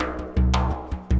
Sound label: lick, ETHNIC STUFF